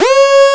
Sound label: Anasound Short hi C, Industrial Analog Single Notes; Sonic Foundry (Sony Creative Software)